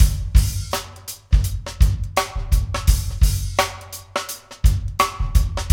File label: Funky Drums; AMG; Now CD-ROM (Roland); Neil Conti